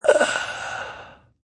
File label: Last Breath, Horror - human male dying, death breath; Scary Breathing; Lynne Music